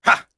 attack voice; UFC Tapout; XBOX

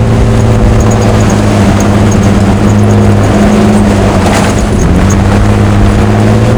truck run wind; Soldier Of Fortune II Double Helix; XBOX; ambience

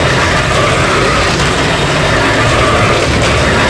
grind pipe, terrains; Tony Hawk's Project 8; XBOX

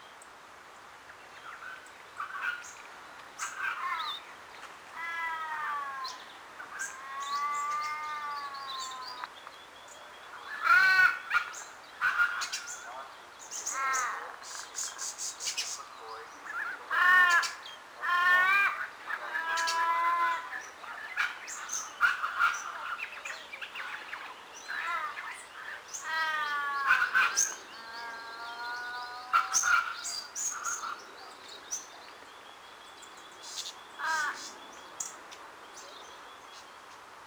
Park; Sydney; Project Gotham Racing 2

